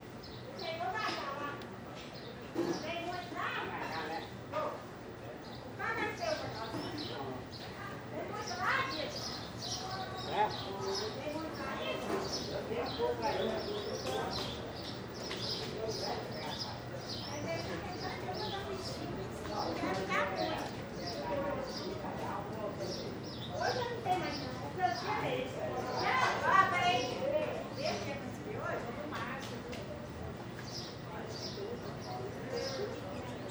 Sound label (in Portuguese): Vozerio mercado central goias velho de manha com senhora falando alto, pas; multidao; passaros